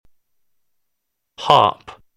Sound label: harp